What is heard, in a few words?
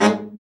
BRA BARI, Bariton Sax